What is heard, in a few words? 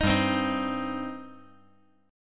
G Suspended 4th Sharp 5th G, down